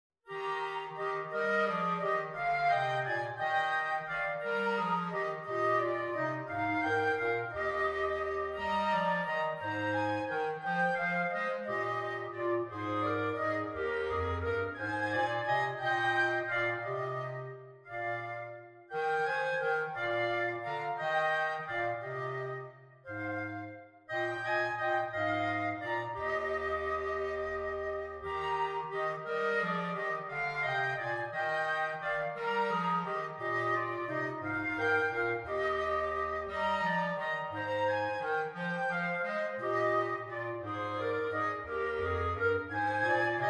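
Bring a Torch Jeannette Isabelle flute clarinet bass clarinet; Christmas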